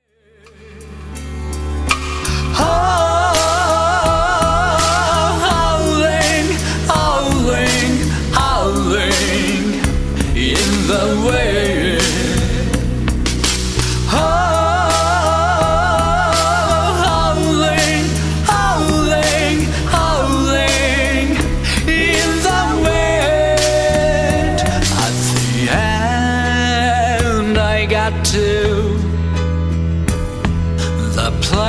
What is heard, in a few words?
Howling in the Wind